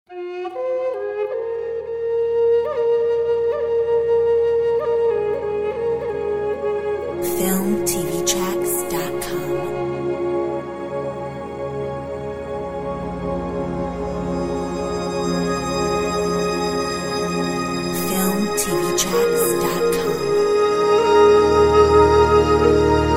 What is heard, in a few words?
Peaceful flute